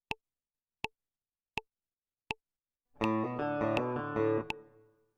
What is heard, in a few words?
intro, guitar